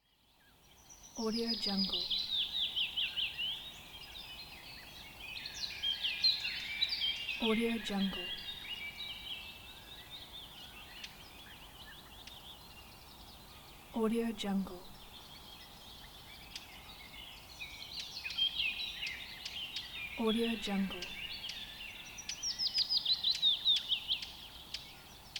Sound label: garden ambience